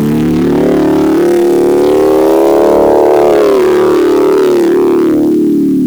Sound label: osc scale